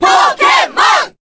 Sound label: Cheer Korean; Pokémon Trainer; SSBB